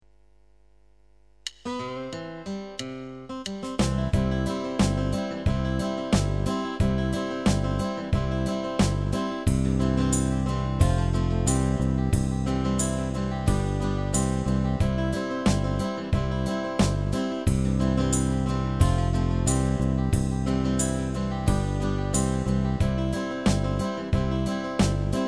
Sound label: Instrumental